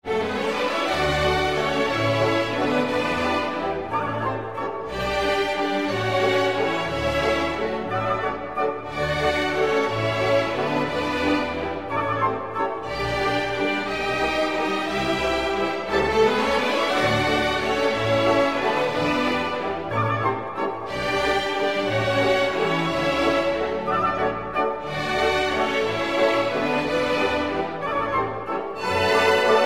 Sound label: waltz ringtone